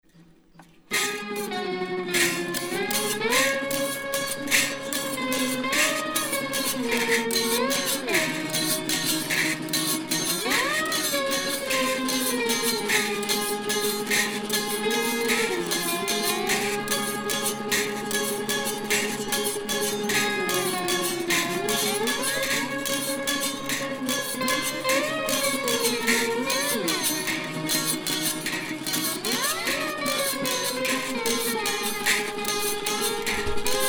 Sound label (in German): jodler; erzherzog johann